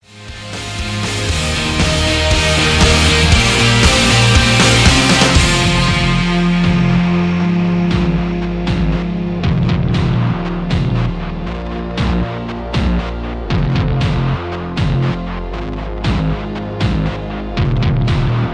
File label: Instrumental